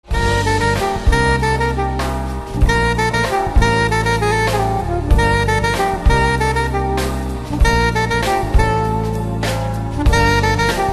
sax

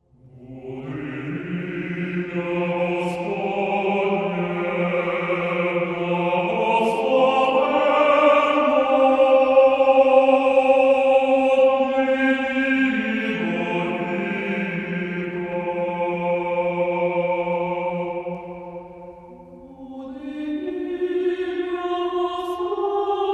Choir Liturhia; Kyiv

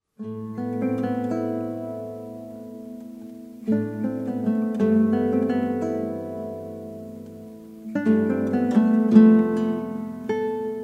Romantic Guitar

